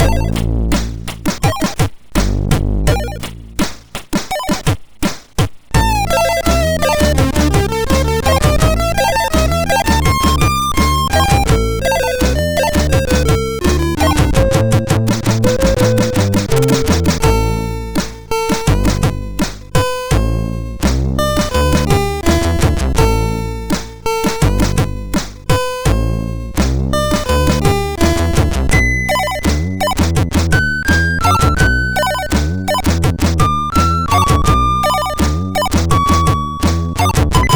Beat, MOS6581

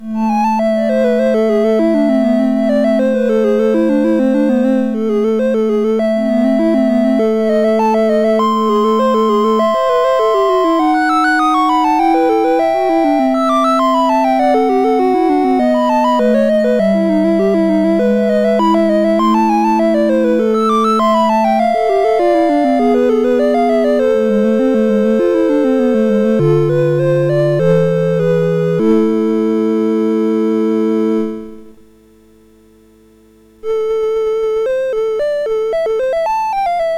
Toccata and Fugue, MOS6581

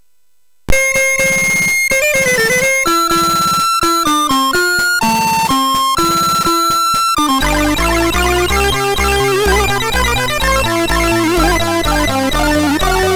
Music Demo Version, MOS6581R3; hvsc

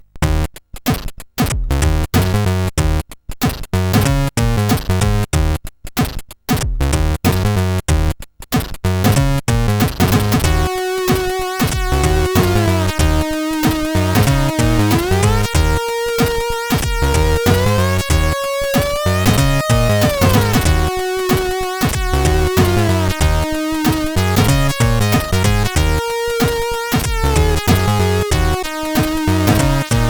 Intro sid CSG8580R5; Checkers

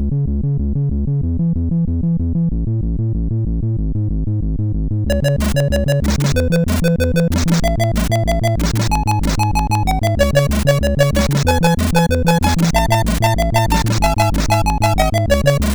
Disco Rock MOS6581R4

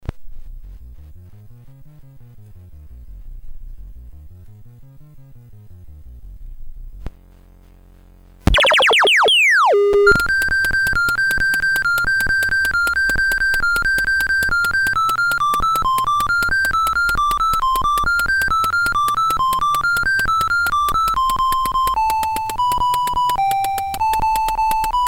CSG8580R5, GAMES; Tron

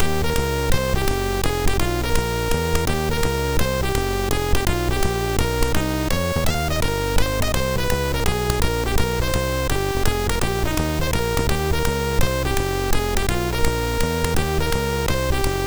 Jazz, sid MOS 6581R2

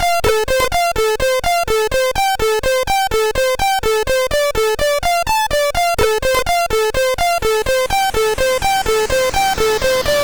Disco China

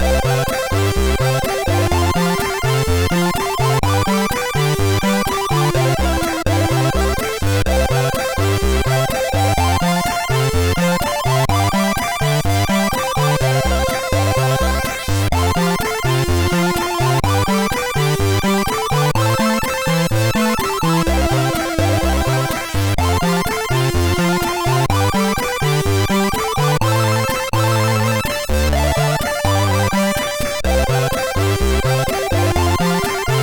Verde, MOS6581R2

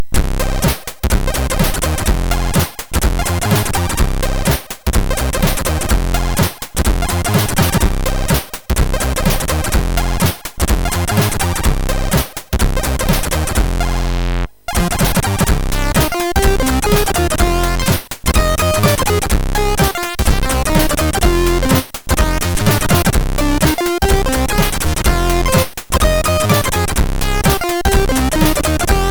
sid, MOS6581R3; Sevenfunk

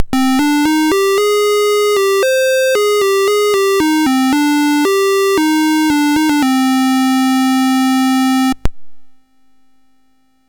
Dragonworld sid MOS6581R4

